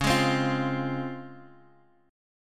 C sharp Major 7th, Guitar, Half Step Down

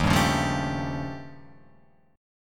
Eb 9th Flat 5th, Guitar Open F; Eb9b5; strummed; 3,2,1,0,0,2; JGuitar

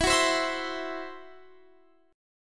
E Suspended 2nd Sharp 5th E, down

